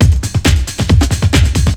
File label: loop; SoundFactory; SMPMANIA